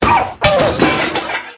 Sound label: Robot Break; Clickergame; My Talking Tom; Android, iOS